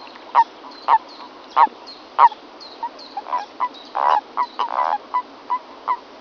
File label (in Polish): bufo bufo, plazy